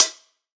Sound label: Hihat; Antidote Advent; Infected Track Drums